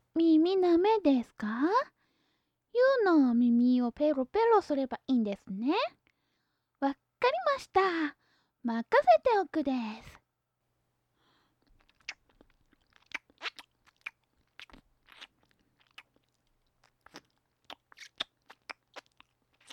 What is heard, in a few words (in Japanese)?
耳なめ; キャリーさんの耳なめ